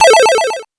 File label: ChipShop Arp Am; CHIPSHOP HITS; ARPS; CHIPSHOP DELUXE